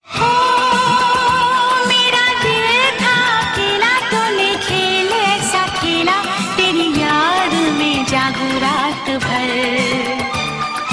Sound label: female; baazigar 1993